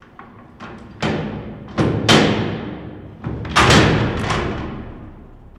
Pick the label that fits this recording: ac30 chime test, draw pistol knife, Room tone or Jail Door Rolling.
Jail Door Rolling